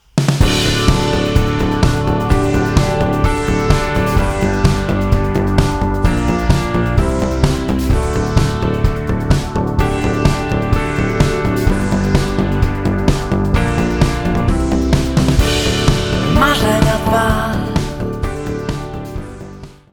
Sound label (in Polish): Galaktyczne disco